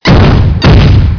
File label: footstep; cstrike; Teddy CSO